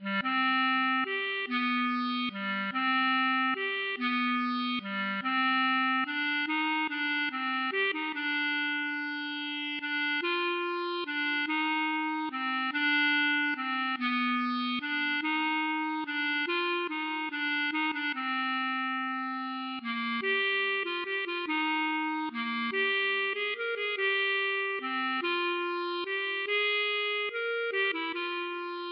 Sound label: klezmer